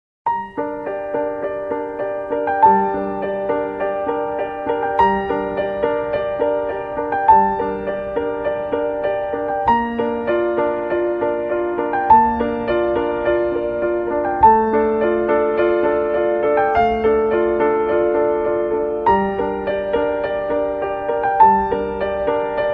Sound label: nice instrumental ringtone